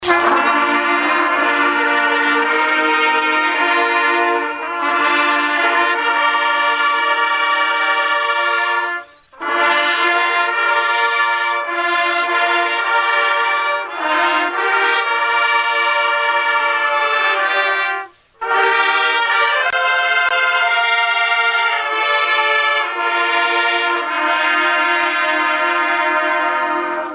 Taps Harmonized, bugle; Arlington; JFK 50th

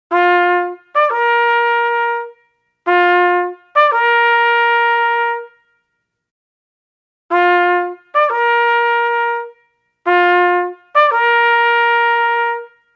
Rise Up

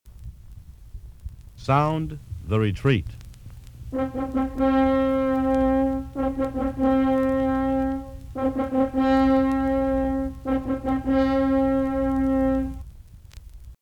The Retreat Bugle

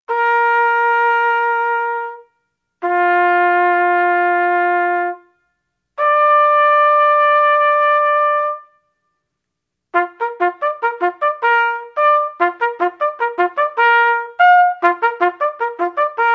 Tattoo

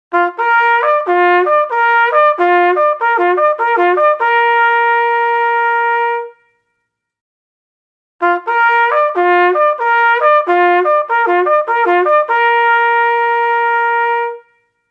Rally Upon The Reserve, bugle

